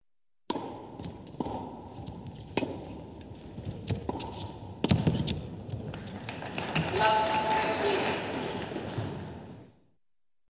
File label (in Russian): tennis aplodismenti zritelej